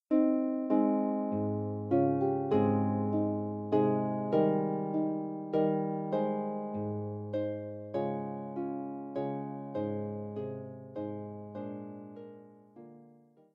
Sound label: Harp